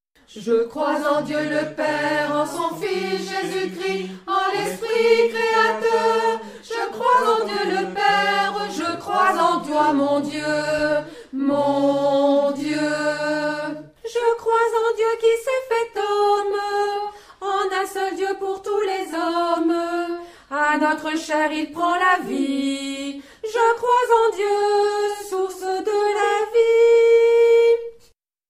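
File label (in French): Je crois en Dieu Refrain Couplet Soprano, chœur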